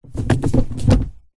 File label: vehicles car gear transmission stick manual movement into gear engine off; zapsplat